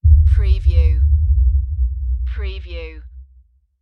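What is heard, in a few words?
Production Element LFE Impact; Sound Effects Bible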